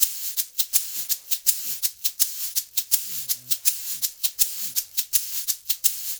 LOOP, SHAKERS, Percussion

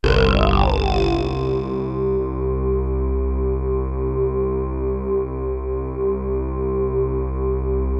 VOCALWOW; MORPHEUS

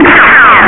shot; ST-XX Amiga Soundtracker Sample Packs

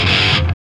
GTR; Roland - LA Composer vol. 2

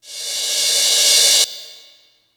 Brush Tap Swirl, Yamaha PSR E305

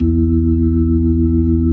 B-3 Jazz E3; Keyboards of The 60's and 70's

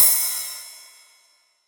909 ride; Goldbaby Tape808 & Tape909; The Tape 909

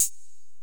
Korg x5, Drums

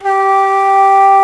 T-FLUTE G3; AKAI S-Series CD-ROM Sound Library VOL-8

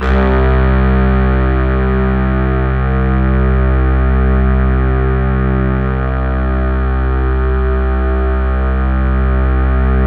C2, Synth Line; 90 s Sample CDs; NiceBeats; SynthLine VOL-27

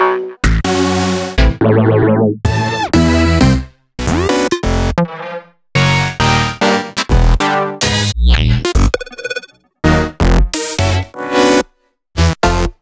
Synth Shots

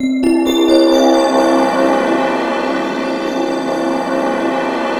TRITON C3; Q Up Arts; Dream Experience

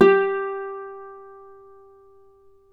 Celtic Harp

